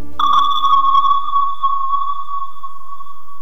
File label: bong